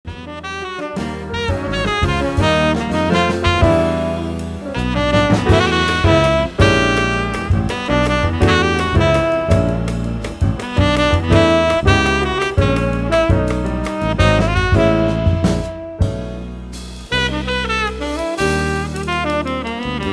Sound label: Funk